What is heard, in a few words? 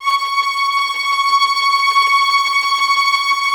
VI TREM F